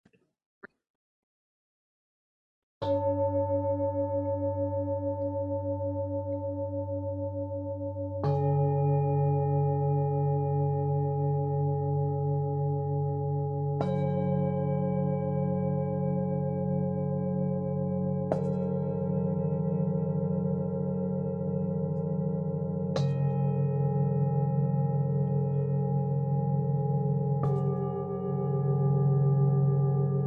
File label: Binaural Beats; Pain; pain relief tonic